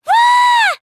wahhh; monophanie

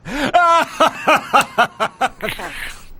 laugh

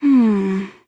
hmmm; mukuro

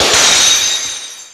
shatter; trilogy

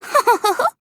hohohoho; shirokuma

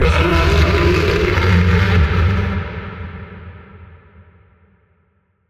monster - robot bounty hunter processed

